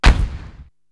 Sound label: BD Big Cannon, Drum Machines; Korg TR-Rack